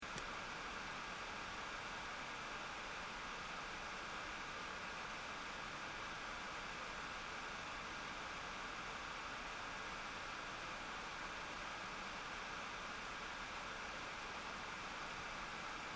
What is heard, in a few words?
high; GT80Titan